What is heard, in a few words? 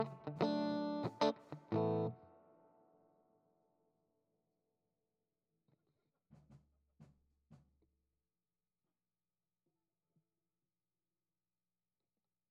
GTR